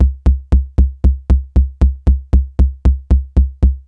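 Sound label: kick, saturated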